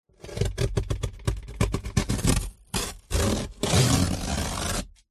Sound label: cutting a corrugated cardboard box with a craft knife; Sound Ex Machina